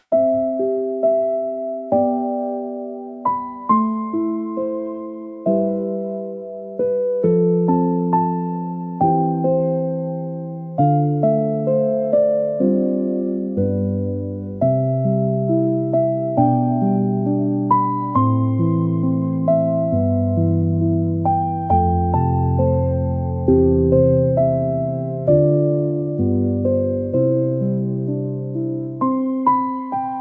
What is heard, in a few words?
fantasy music nature forest